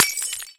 glass fall

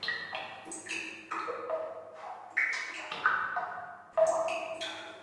drops

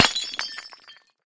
bottle break; bottle collide

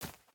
step grass

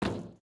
tin, step

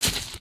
grass, human step

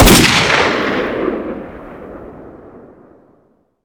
svu shot

